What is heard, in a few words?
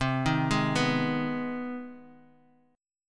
C Minor Major 7th Sharp 5th, chord; arpeggiated; JGuitar